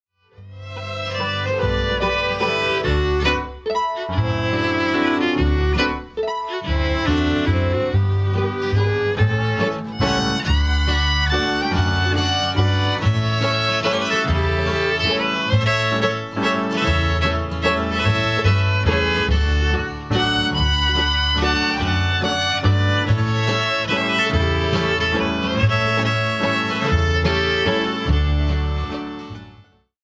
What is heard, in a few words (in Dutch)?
instrumentaal